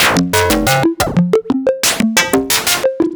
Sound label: FM Dirt Loop; Polyend; Stazma & Concrete Collage